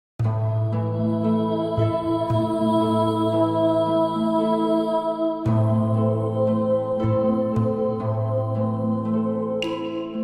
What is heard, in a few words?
unpromised mystical music